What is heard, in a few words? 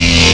Laser A Sustain